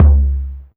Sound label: Low Tome Drum Sample C# Key, Royality free tom drum sound tuned to the C# note